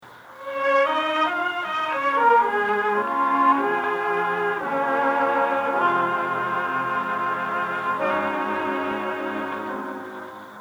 Irish Love Song; 1934 Broadcasts; Ernestine Schumann-Heink

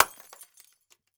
Crashes Light Bulb Impact Dropping On Cement

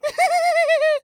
Cartoon Human Male Giggle

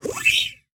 Animation Short; Multimedia Internet CD-Rom Flash

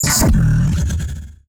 Multimedia Internet CD-Rom Flash Radio Element

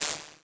Animation Electronic

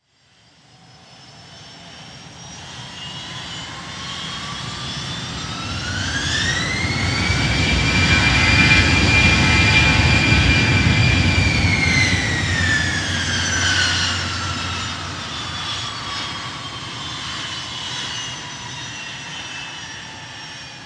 Airplane Jet Idle Drive By On Runway; Transportation

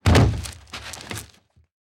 Impact Body Thrown Into Table

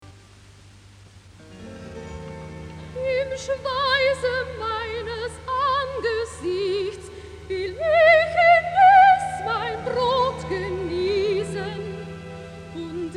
Recitativo, Sopran

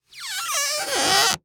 Cartoon Balloon Rubbing

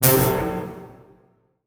Transition Hit Impact Electrical; Adobe; Production Element

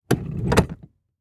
Auto Saturn Adjust Seat Interior; Transportation

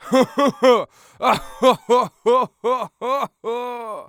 Human Male Pirate Laugh Voice

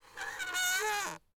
Cartoon Squeak; AdobeCC

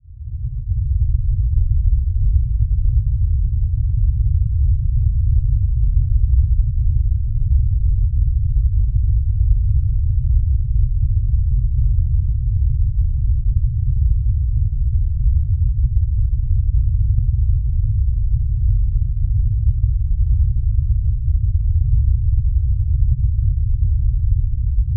Weather Earthquake Rumble Subsonic Bass LFE; AdobeCC

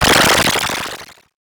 Multimedia Internet CD-Rom Flash Animation

